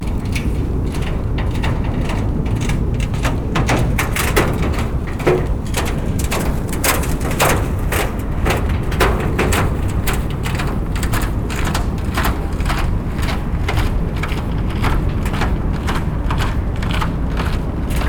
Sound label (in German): Bruecke im Schnee (Berlin)